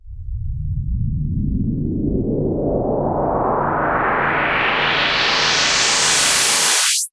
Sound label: FX Sweep Up